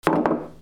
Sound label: GARAGE